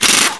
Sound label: RADIO ON; mtm2